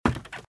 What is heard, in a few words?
Footsteps - Wood - Creaky - Run - Left; RadwinIsland